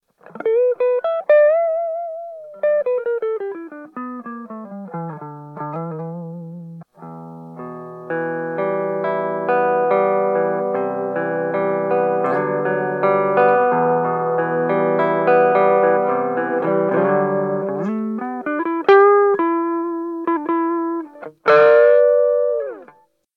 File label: vox clean, portable vox amps, electric guitar; orange